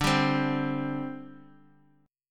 D 7th Suspended 4th D Bass Standard; strummed; JGuitar

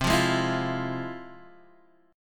F Minor Major 7th C, guitar chord

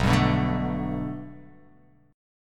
B Minor 7th Sharp 5th B, chord

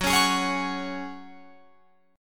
F sharp Suspended 2nd, Guitar Open F; strummed; 18,20,20,20,21,20

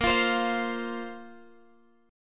Bb Suspended 2nd, Guitar, Drop D; strummed; 20,20,22,22,x,x

